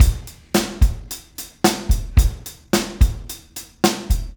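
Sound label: beat, Room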